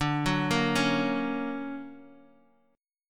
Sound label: D Augmented Major 7th down Bass Standard; D+M7 arpeggiated; JGuitar